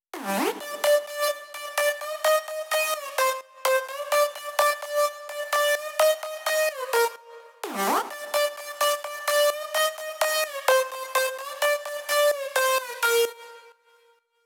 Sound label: 128BPM Synth Lead Add2 Wet SC, Root G; Vengeance - Essential Clubsounds Vol.5; VEC5 Demo Constr Kits; Early Morning Kit